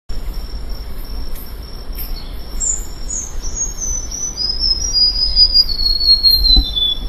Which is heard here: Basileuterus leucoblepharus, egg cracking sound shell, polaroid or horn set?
Basileuterus leucoblepharus